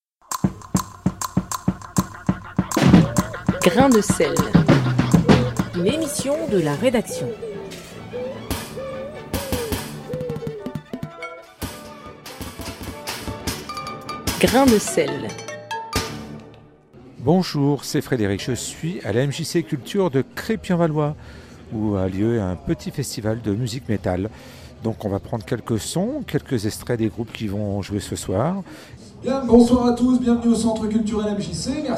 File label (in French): concert metal; MJC DE CREPY